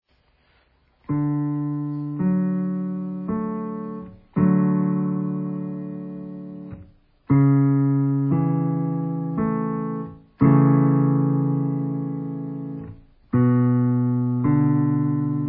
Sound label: Dmaj